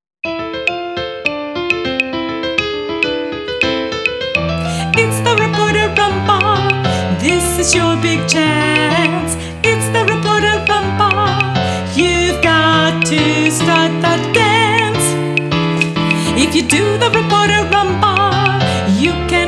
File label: reporter rumba reprise